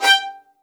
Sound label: XLD2 G5, LOUD VIOLINS; Q Up Arts; Denny Jaeger Master Violin Library